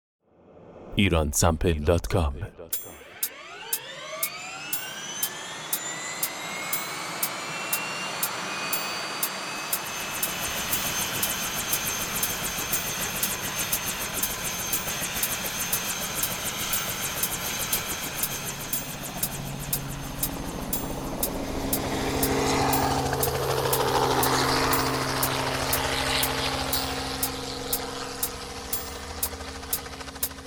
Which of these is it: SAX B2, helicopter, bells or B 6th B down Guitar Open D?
helicopter